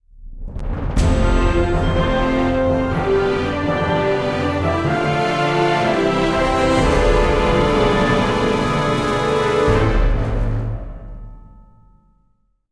Action Sting; Jurassic Park The Game

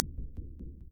Running

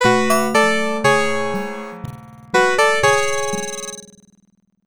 Defeat; nobunaga no yabou zenkoku ban; x68000

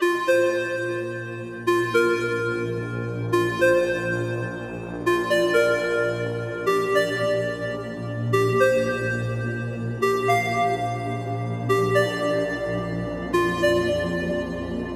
Loop 108bpm; Oprever; jenny leclue detectiv

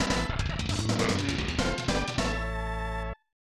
Level Clear; Run Saber; SNES

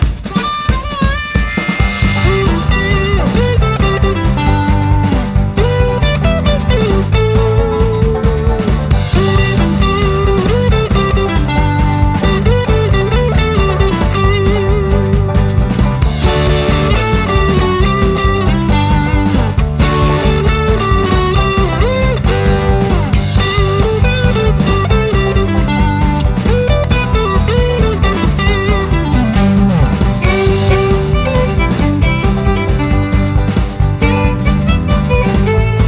Country LOOP; Trolls

